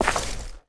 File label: male grass, footstep